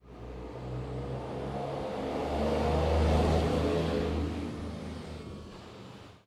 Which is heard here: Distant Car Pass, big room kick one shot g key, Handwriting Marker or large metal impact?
Distant Car Pass